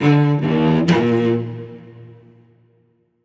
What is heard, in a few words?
Music Level Fight Stinger; Krish